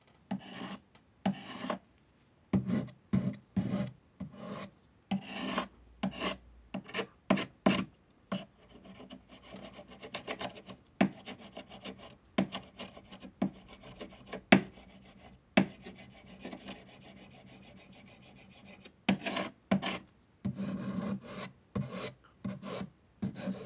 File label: Wood Carving